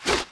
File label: Vulture Wing Flap; Playable Tutorial Level; TR3